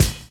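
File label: drum chop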